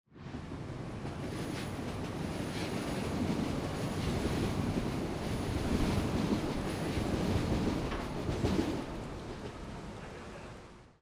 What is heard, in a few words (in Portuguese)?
Metro passando em linha a ceu aberto; maquinas; em Aguas Lindas; colecao sonora do cerrado